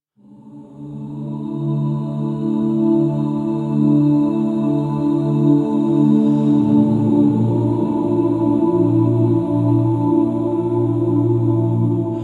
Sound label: Hub Amb Cue, Music; Warframe; DE; Dynamic Music; Entrati